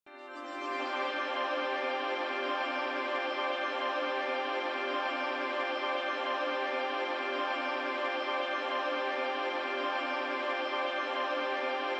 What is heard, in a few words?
ambient